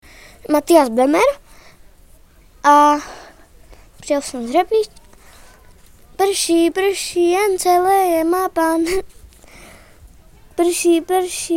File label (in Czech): slavíci; ornitologický víkend